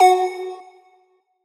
One-Shot F#, Synths; Ghosthack; Signal

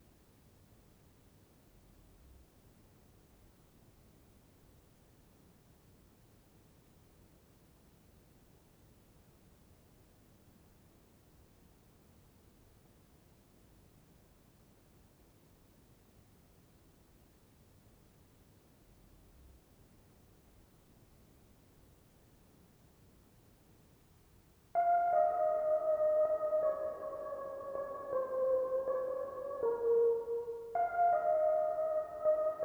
80 BPM F# Min Synth, Lofi; Melodies; Loop Stems; Cymatics; GHOST (Beta Pack); Blue Wish

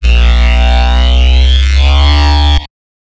Bassline, Dubstep and Trap

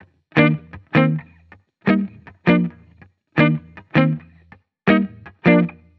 Guitar Chord Loop 160 BPM D# Maj; Future Bass; Instruments; Guitar Loops

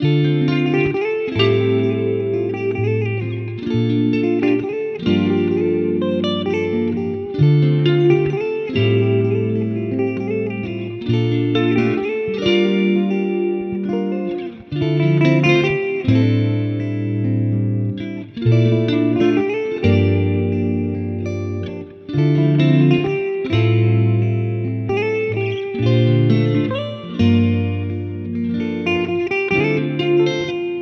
Journey Guitar Loops, 130 BPM D Min; Origami; Cymatics

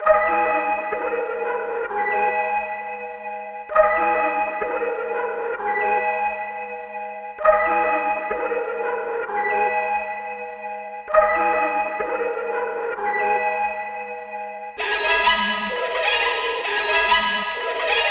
130 BPM G# Min; Cymatics; Hyperactive

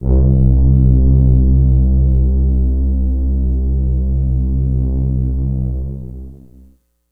Prophet 600 BASS Abyss - C; One Shots; Cymatics; Fantasy Synth Sample Pack

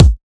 Dubstep Kick; Ghosthack; Dubstep Sound Arsenal

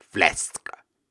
Syllables and Imaginary Words, Viking Vocal, Hard

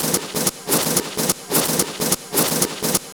funny machine fx; Cartoon - film effects

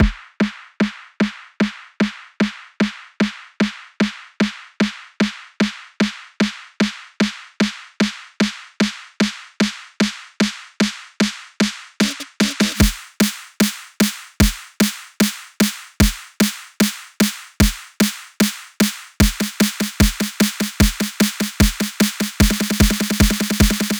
Light Buildup Loop - 150 BPM; Cymatics; Light Free Sample Pack; Light Samples

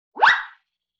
Wind Whistle Zip

